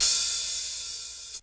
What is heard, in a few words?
HIHAT; Crypton - DrumBase5000